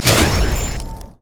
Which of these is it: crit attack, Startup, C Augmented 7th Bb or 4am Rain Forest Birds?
crit attack